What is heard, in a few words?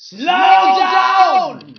slowdown high